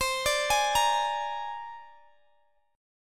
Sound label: C 7th Suspended 2nd Sharp 5th C down Guitar Standard; C7sus2#5 arpeggiated; JGuitar